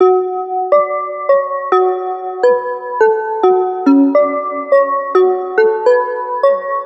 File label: Riddim Melodic Loop - F# 140; Cavern; Ghosthack; Riddim Essentials Volume 2